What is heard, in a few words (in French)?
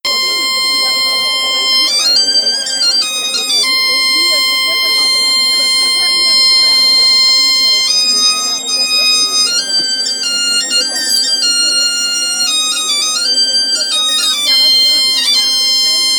ambiance fest-noz